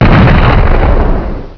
rock l x, weapons; quake2

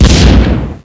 shot g f, weapons; quake2

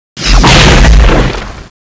laser shot, weapons; quake2

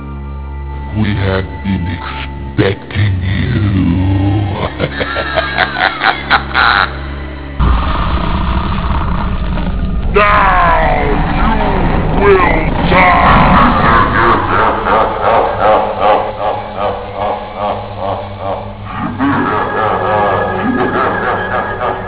haunt; quake2; stingy

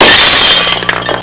glass; quake2

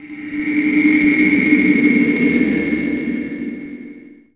ufo; quake2; okami

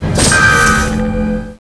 laser target; quake2; weapons

